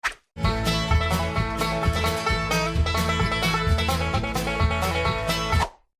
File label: Banjo Riff